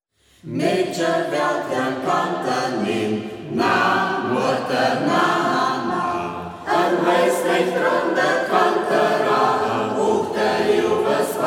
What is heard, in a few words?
singkreis, Braller; Volkslied; Ortsmundart: Braller; Medche wällt t'en Kanter niën?; Singkreis Kampestweinkel; Hegt wird gesangen